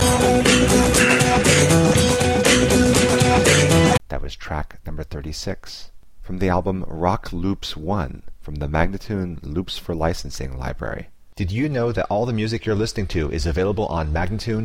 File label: 120 B spoken, Rock Loops; Loops For Licensing